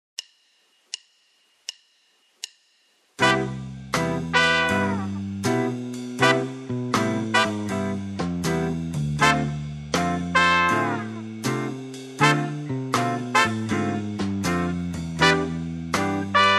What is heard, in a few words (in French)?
modes bebop majeure tempo 080; exercices; gamme bebop majeure